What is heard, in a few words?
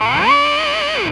HIGH FUZZ, Funk Gtr & Wah; PlatinumPhattCD2; E-MU Producer Series Vol. 8 – Platinum Phatt